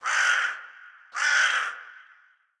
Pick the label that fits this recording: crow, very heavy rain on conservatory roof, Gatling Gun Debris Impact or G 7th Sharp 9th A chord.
crow